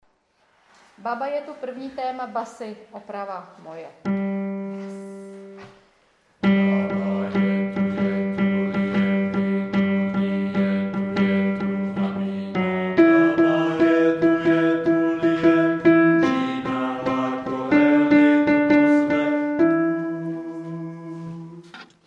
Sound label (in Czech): prvni cast kluci bas